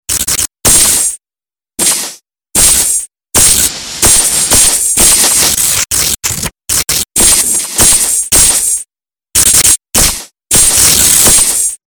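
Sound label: future energy sword hit sequence layer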